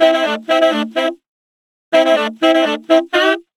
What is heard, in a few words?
Sax Ens; Disco House